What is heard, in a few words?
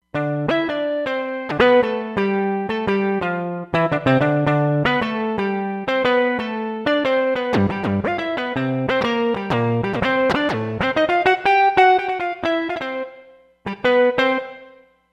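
Nice Oct Guitar, synth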